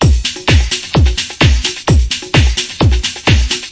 electrode house, drum loops and breaks, 130 bpm

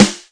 R8 Snare

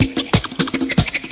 hyper bambatta, drum loops and breaks

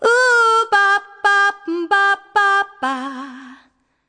100 bpm Line; VOCAL OR VOXY LOOPS

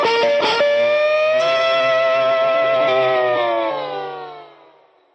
fuzzy slide guitar

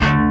orchestra string hit D

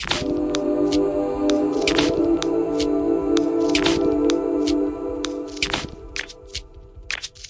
128 fem vox jazz chords over crunchy bits; VOCAL OR VOXY LOOPS

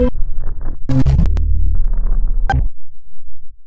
subwoofer mice; LOOPING AMBIENCE

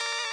alien telephone, electronic hits

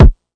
Thin Vele Kick Drum; drums; hip hop; STYLE KITS (Hip Hop, Etc); Khezie Hip Hop Kit

